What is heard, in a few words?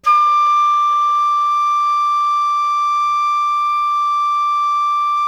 FLUTE FF D6; Greytsounds; Philip Wolfe David Crozier's Saxophones